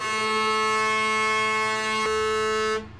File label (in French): corne de brume